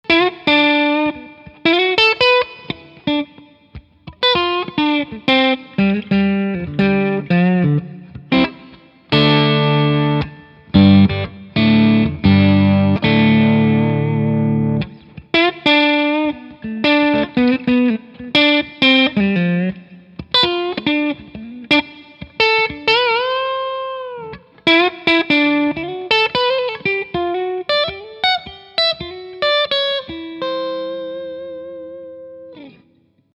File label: D20 clean TBM12h VC6